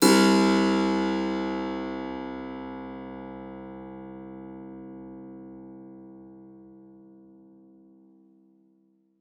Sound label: SPIN B1, SPINET; Xsample VOL-7